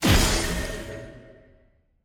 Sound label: enemy boss teleport in; bloom minigame